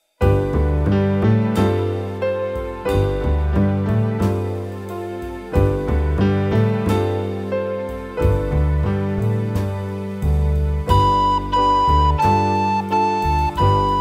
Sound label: backing track, recorder